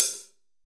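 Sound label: 14 HH CLSD, hi-hat closed, AMB KIT; Real Mega Drums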